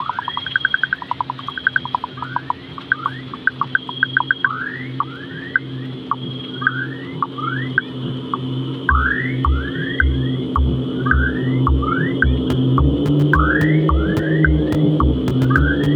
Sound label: radiospot podklad; mfdf jihlava